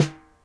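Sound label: snare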